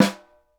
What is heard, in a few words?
snare, Roland; LA Composer